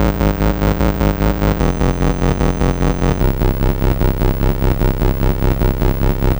Atari 2600 Bass, chiptune

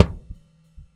Swinging, Dimensional RL Kicks; Airwindows

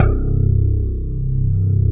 CLAV, FUNK FM CLAV

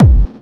Clubby Kicks, Bassdrums

